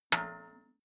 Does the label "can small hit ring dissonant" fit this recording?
Yes